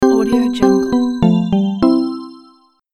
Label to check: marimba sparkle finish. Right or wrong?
Right